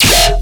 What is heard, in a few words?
Bass Hit E, Dubstep; Gleam Bass; Ghosthack; AC2024 - Day 13 - Dubstep Sounds 2025